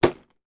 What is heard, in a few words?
handbag impact wall; sound effects one